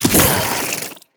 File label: worm spit start, creatures